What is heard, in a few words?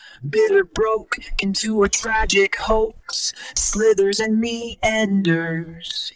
vox; ComfyUI